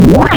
HMMMMMMM

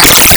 DRONE

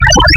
SINE

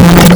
BASS

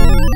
BELLS

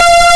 supersaw

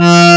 ORGAN

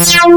VOCAL; WaveEdit; wavetable editor; Synthesis Technology

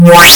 SINE; WaveEdit; wavetable editor; Synthesis Technology

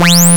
RESO SAW

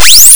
CLOCK; WaveEdit; wavetable editor; Synthesis Technology

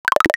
QUACK

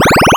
BELL

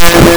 LOFI RISE

